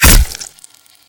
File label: stab, Gore